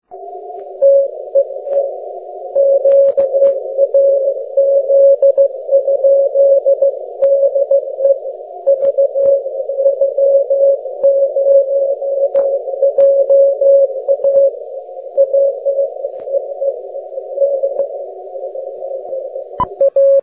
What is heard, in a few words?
30m cw